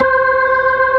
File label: B3, Drawbars